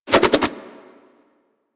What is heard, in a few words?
Nunchuck Spin Whoosh; Warframe; Infested